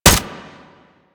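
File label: Primary crossbow Shot; Warframe; Tenno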